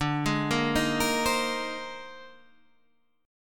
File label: A sharp 11th